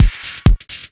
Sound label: Dance Drumloop; Drums; Dance Beats